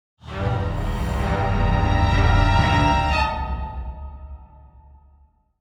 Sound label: Play Stinger; Hireek